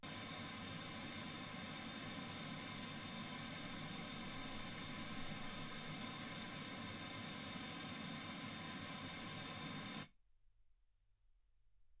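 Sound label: white gaussian noise 48kHz; single mic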